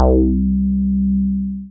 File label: Single Electric Basses, Dance Floor Bass